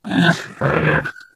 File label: ballbot meh